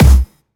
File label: Clubby Kicks, Bassdrums